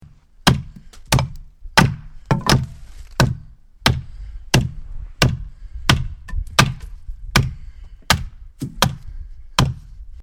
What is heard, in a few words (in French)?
degrossir bois